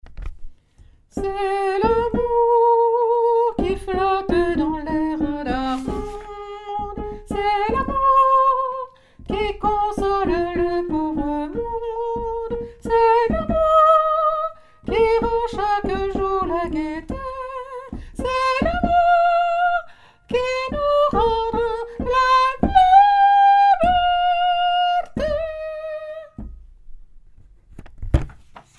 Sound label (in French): saltimbanques soprano